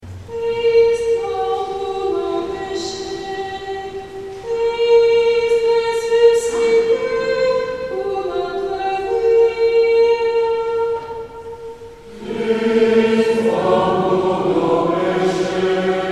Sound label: Tutti; Christ mort pour nos peches